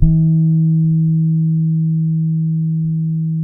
SAMBA, Bass; Spectrasonics; Bass Legends; TYLER SAMBA

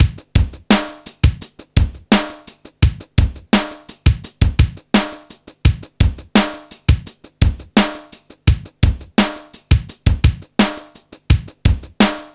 Funky Xtreams rock; INIS

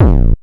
Kick, Ensoniq ASR-X Pro

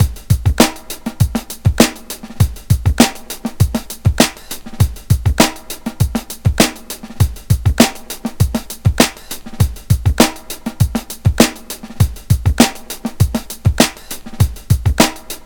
100 Snarey Funky, Breaks; Lockdown Breaks

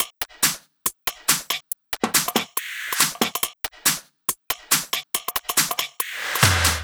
drum Loop 140BPM Full

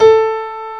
BOSENDO A4; East West - Global Sounds

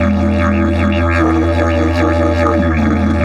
150, DIDGE RIFFS

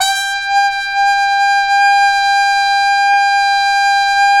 BRS TP, Roland, TRUMPETS; Roland - LA Composer vol. 1

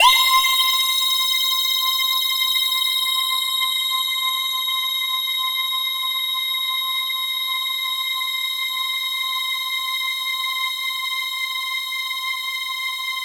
Long Synth String C

